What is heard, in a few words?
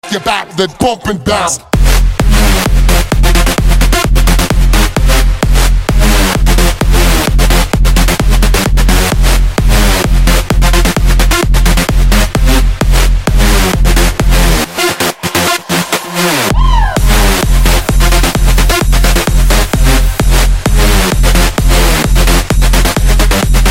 Electro House